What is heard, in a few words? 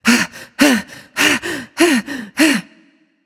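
breath, female voice; smite; voicepack; hera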